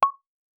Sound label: message received